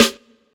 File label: dirty snare a sharp key, snare drum sound tuned to the A# note. Loudest frequency: 2510Hz